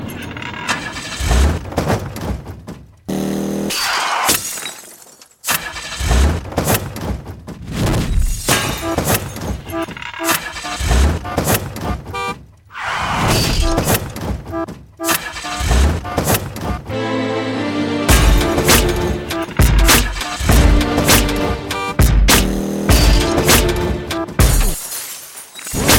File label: Car; Wade Robson